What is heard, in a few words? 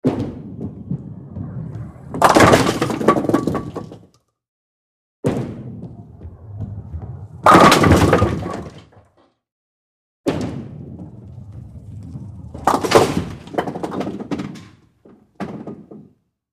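Bowling Ball Down Lane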